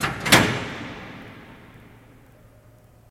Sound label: Prison Door Big Lock; jail & prison doors